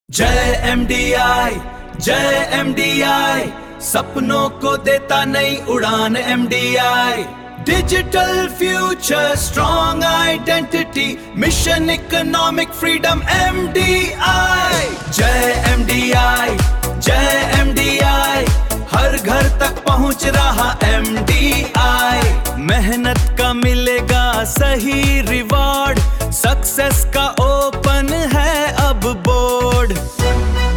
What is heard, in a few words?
Ringtone